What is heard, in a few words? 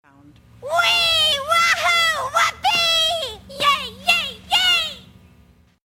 yayayay